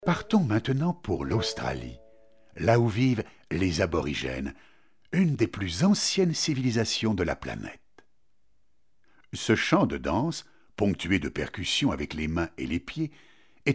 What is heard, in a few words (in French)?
musique australienne chant de danse wongga musique aborigene